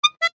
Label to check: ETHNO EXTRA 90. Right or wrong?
Wrong